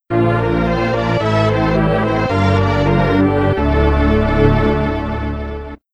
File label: item acquisition; metroid